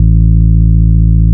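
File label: Juno 106 resonant band pass A1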